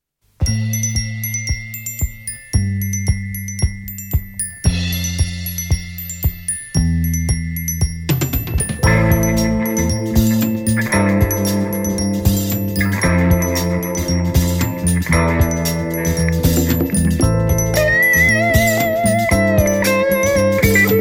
shakuhachi & koto jazz funk

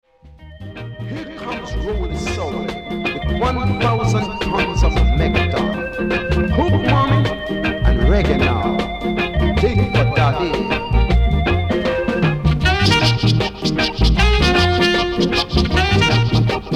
instrumental reggae

